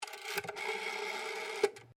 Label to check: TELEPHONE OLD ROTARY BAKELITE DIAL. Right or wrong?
Right